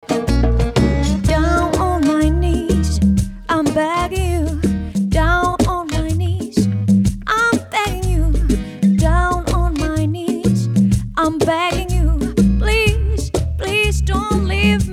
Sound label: Medley Rythm n Soul